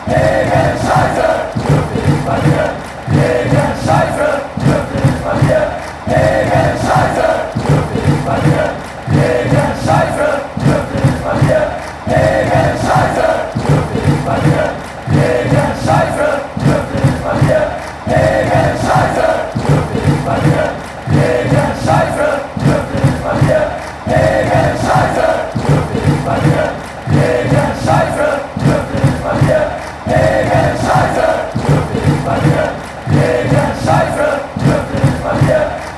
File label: against schalke, fanchants